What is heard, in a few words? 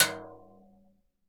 CASCARA, Synclavier Percussion